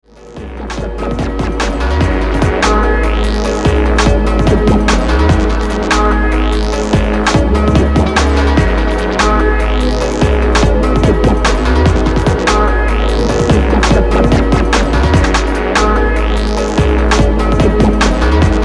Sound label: Saw Sine Square